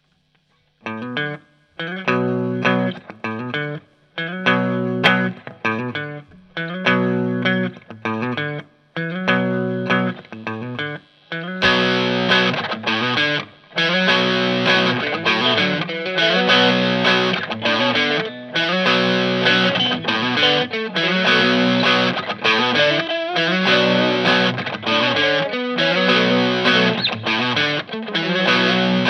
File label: nexi screamer amp